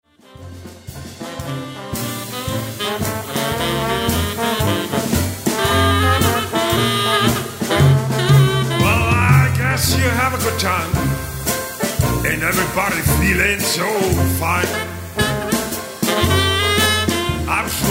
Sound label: Merry Christmas Blues, jazz band